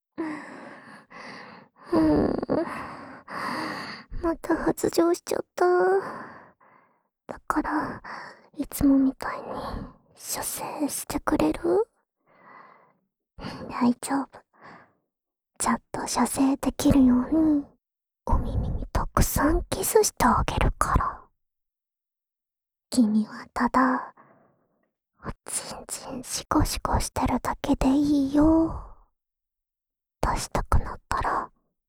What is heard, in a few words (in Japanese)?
唾液少なめの耳舐めループ（右耳）, asmr; オナサポ用; オナサポ用唾液少なめの耳舐めループ（右耳）